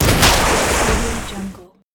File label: car water splash